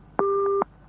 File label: Engaged tone